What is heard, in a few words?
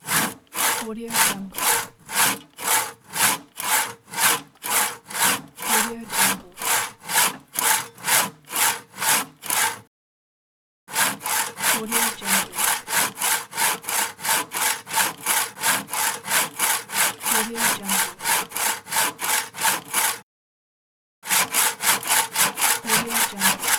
sawing wood loop